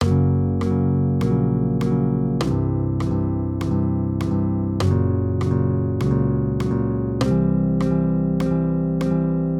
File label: maj triads; Gb-C; accompaniment